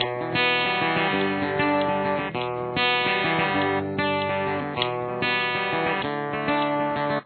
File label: chorus, guitar